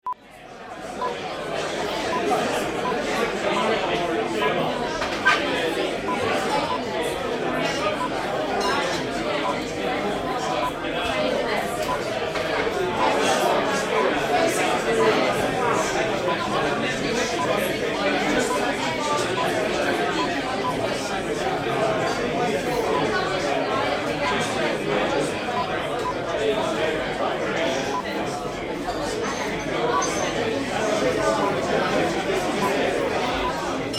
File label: Pub London; Clachan Inn